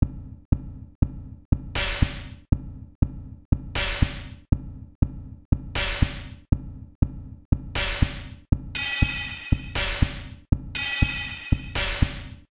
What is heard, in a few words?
Sine Wave